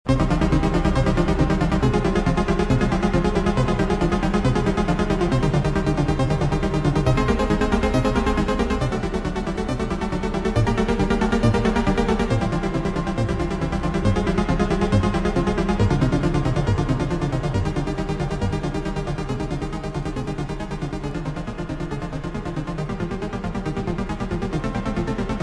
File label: Prelude C Minor (BWV847) + Hall